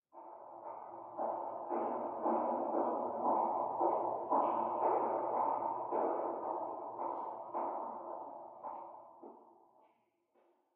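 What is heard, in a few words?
Footsteps Female Walking Di, stairs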